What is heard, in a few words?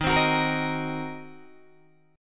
D sharp Minor 6th D sharp, down, Guitar, Open D